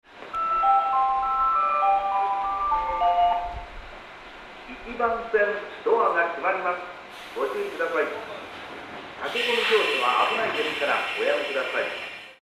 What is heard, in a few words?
melody